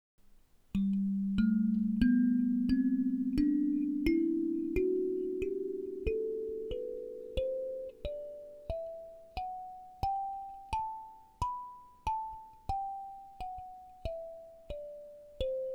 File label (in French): Kalimba diatonic 17 Sol Majeur